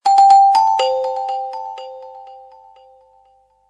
Horizon Sms

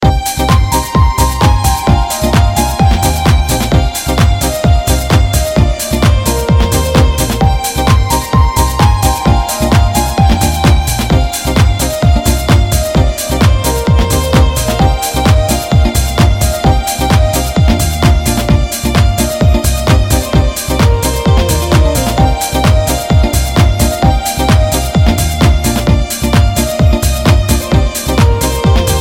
HOUSE MIX

